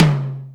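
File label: Amb Hi Clear, Alesis D4 drum machine tom; 300 Drum Machines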